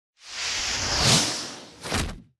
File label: teleport; Yo-kai Watch 4 Bokura wa Onajikara o Miage Tale